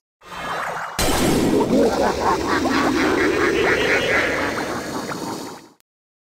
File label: Poison Spell Sound Effect